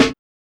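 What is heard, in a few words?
HSE SD, house snare drum; X-Static Goldmine 1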